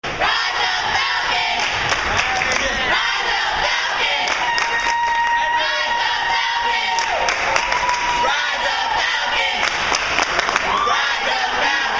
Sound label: Cheering Fans